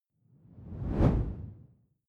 whoosh simple low; swooshes-swishes

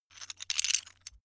metal pieces clatter short

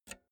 pull out jack multi socket quick; objekte; elektronik

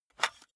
cassette case open hard; objekte; kassette

